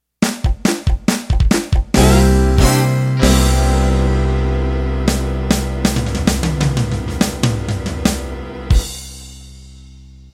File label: Country Boogie End